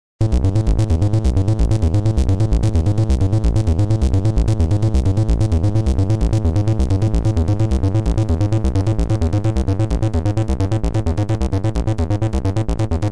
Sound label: analog synthesizers sequencers; dr zee workshop